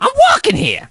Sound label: crow get hit